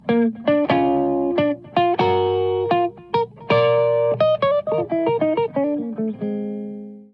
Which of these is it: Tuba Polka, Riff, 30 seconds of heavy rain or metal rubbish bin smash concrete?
Riff